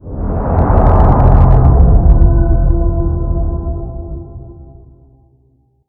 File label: obstacle